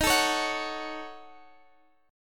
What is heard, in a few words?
Eb Minor 7th Flat 5th Eb down Guitar Standard; strummed; chord; JGuitar